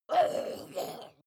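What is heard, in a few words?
witch groan short, creatures; kreaturen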